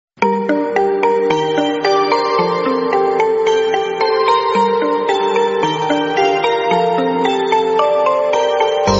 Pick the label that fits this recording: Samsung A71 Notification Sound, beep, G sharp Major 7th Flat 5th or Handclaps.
Samsung A71 Notification Sound